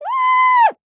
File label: Animal Mammal Carnivore Elephant Seal Cub Call; animals; AdobeCC